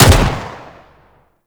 Pistol Shot; Kohm

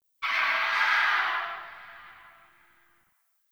Tree Distant Critters Angry; Ambience; Warframe; Duviri

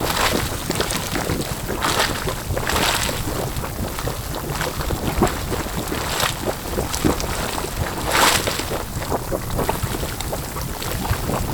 Lair Bubbling Water Loop; The War Within; Queens

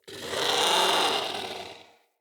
Creature; Ambience; Corrupted Ode Three

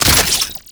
Crafting Equip; Cetus; Hunter

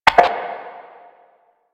Static; Warframe; Lasrian; Lasrian Enemy B

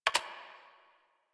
Computer Keyboard Space Bar; Warframe; NineteenNinetyNine; Messenger

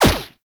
Fire; Warframe; Caliber Chicks Minigame; Boss

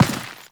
Step Dirt; Warframe; Teshin

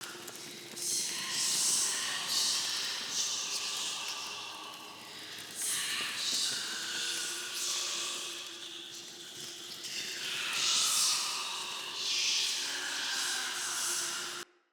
Crypt Ambience Soldier Loop; Under City

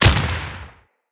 Deathshot, Bullet Impacts; Weapons

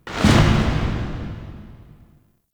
Interior Orbital Station March; Warframe; Tau

